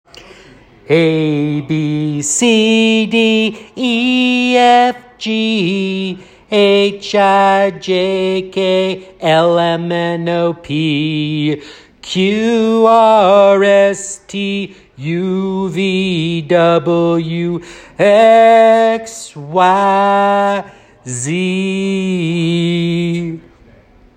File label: Alphabet Song